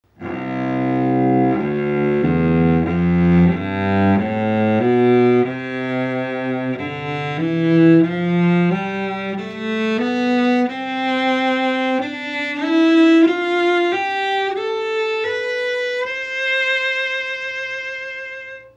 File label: Carbon, cello, carbon endpin